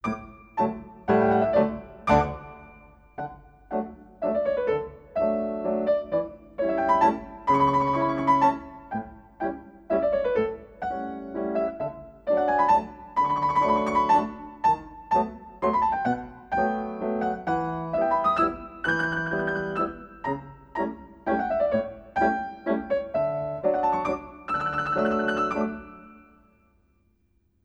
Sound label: Echappes Releves And Classical Walks